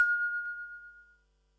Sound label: Perc, Percussion and FX; Pearl SC-40